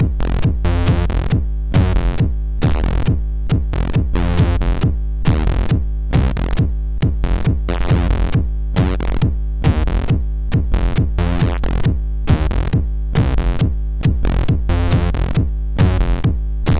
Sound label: Gabber MOS6581R4